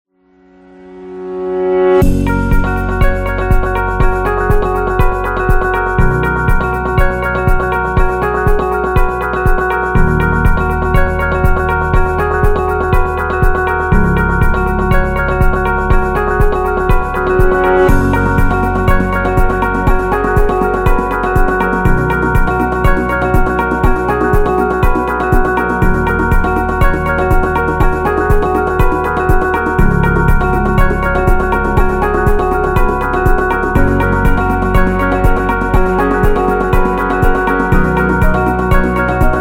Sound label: Fashion Corporate; corporate music vol 5